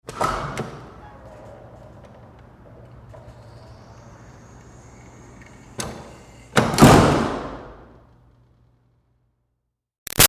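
Door Metal Commercial Push Interior Hard Open Close; Metal Doors; Big Room Sound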